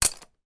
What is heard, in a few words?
office plastic childs scissors drop on to pens and pencils in pencil case